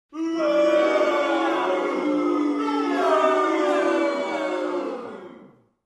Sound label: Crowd booing